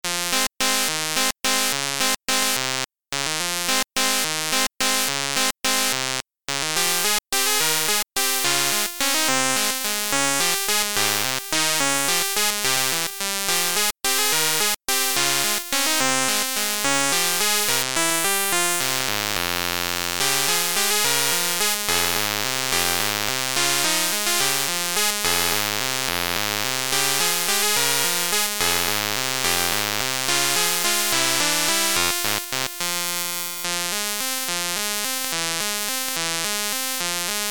candlelit waltz 1 bit

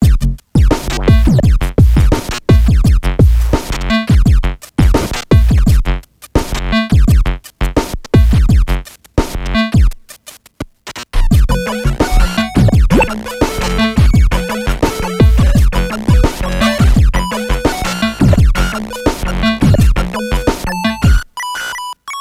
celesta lilt

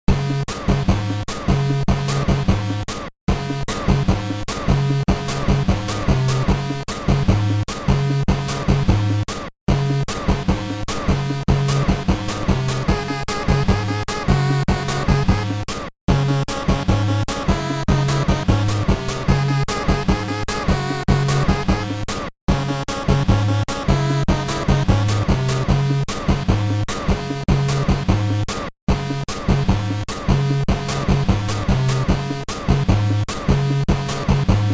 dance! party!

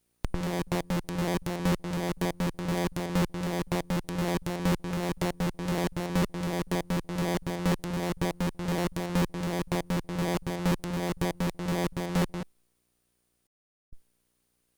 carter game boy vs stock normal game boy

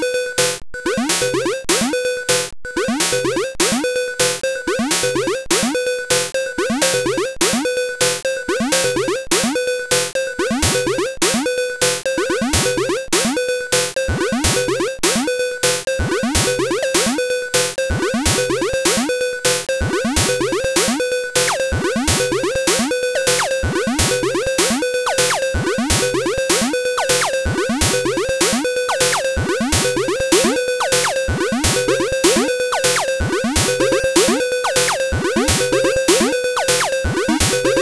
bleep bloop

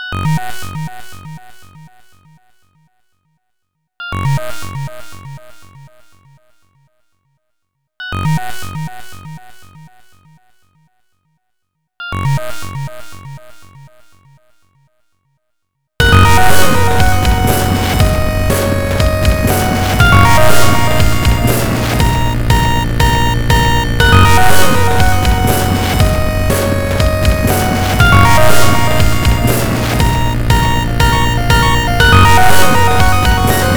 pxtone

